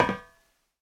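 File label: not strong hit with a blunt object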